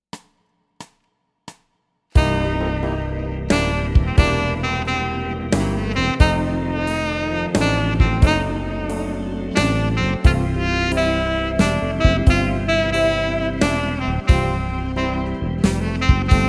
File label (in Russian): Track plyus potom minus